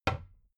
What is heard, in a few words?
cabinet door close soft